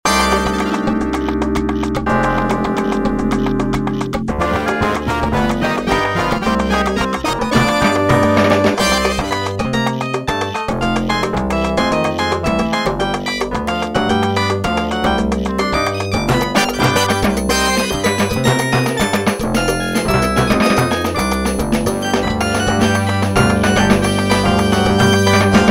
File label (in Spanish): El Tono De Salsa, Latin; Yamaha SMAF demo ringtones; World